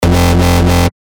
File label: Bass Shots F; Threat; revealed bass shots vol 3